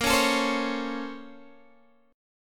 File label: A sharp Minor Major 9th, down, Guitar, Open D